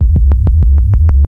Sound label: Juno Bass loop